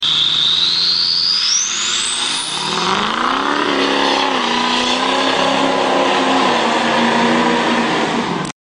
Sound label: Ford Diesel